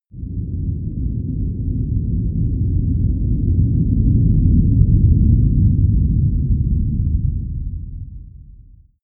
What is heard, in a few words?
whoosh low slow; whooshes